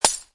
glass break; Adventures in Lestoria; action RPG game; Sig Productions

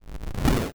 rise; action RPG game; Adventures in Lestoria; Sig Productions

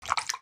slime walk; action RPG game; Adventures in Lestoria; Sig Productions

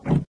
bear slam; Adventures in Lestoria; action RPG game; Sig Productions

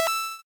lockon special; action RPG game; Adventures in Lestoria; Sig Productions

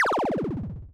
lose

